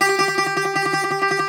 Greek Bazouki, Plucked Strings

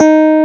PUNCHREEDS; Wizoo - Powered DX

